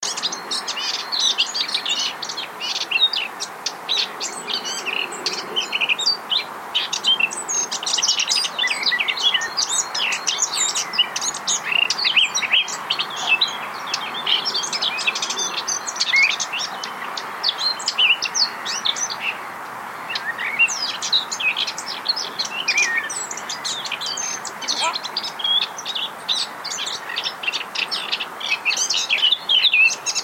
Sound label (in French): rossignol